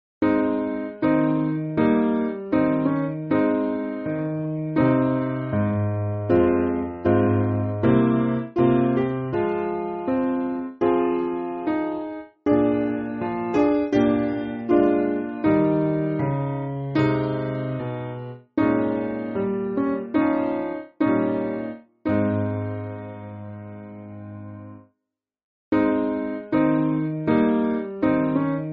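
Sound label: Piano, Backing Files for Churches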